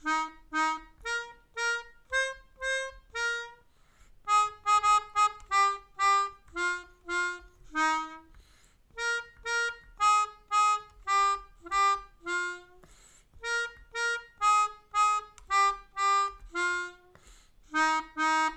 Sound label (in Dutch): Kortjakje, Melodica